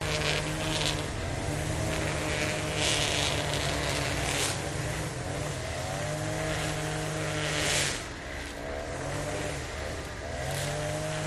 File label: la paz brushcutters trimmers; your free sounds